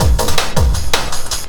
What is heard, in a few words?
Drum & Bass, 160 BPM